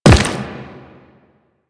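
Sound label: step on rock, footsteps; Warframe; The New War; Kahl; Quests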